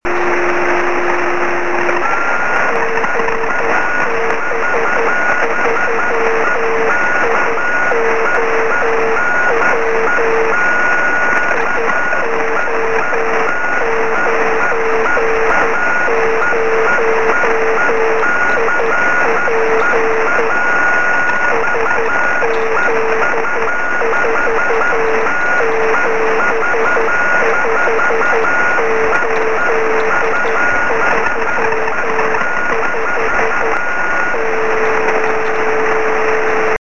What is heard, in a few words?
beacon 23cm; sm7lcb; gb3iow